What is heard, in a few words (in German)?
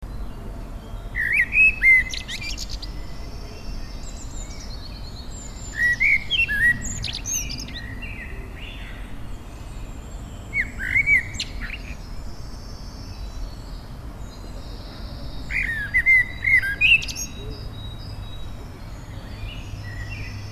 vogel heimisch; biologie; hecke; april